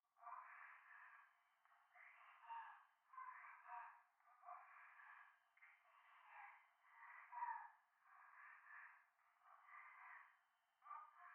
右耳 密着 処女 喘ぎ 山奥; 夢の中

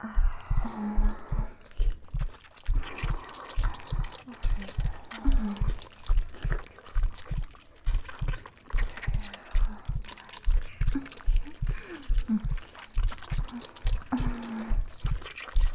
両乳首舐め・フェラチオ搾精

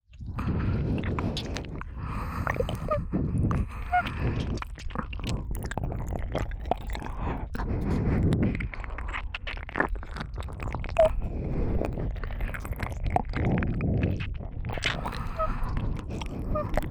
両耳 耳奥舐め; ねっとりエッチ; 白耳; くちゅ系効果音版

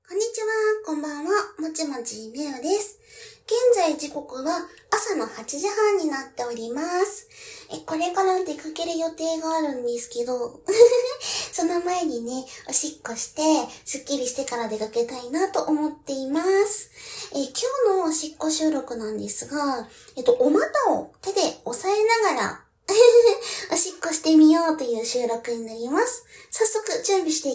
おしっこ実演 お股を手でおさえながらおしっこ

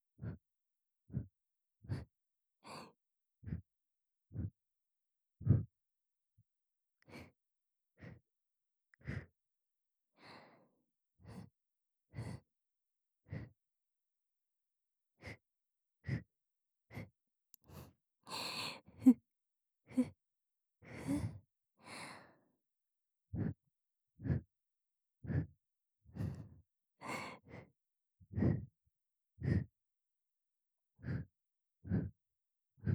連続吐息 (左耳)

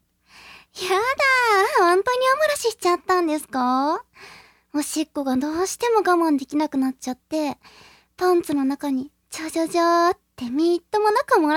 生意気な年下の女子の前でおしっこ漏らして笑われる音声

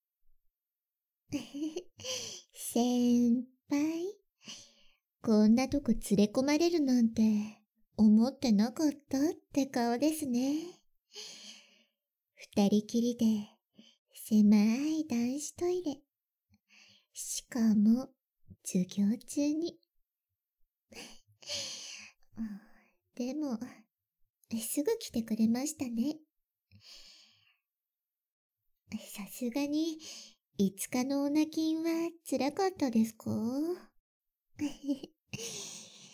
太ももオナホで大量射精♪オカズ写真で耳舐め太ももコキ 脳じゅわ耳舐め・オカズ写真見せつけ・太ももコキ 効果音なし

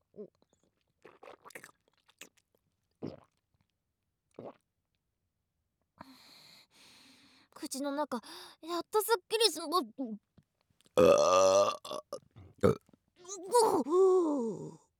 口の中すっきりザーメンゲップ♪; ザーメンゲップ集

